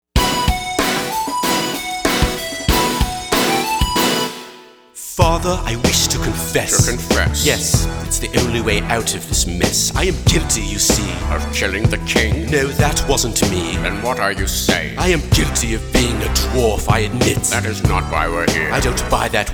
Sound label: Rap Battle; Tyrion Lannister, Tywin Lannister; musical thrones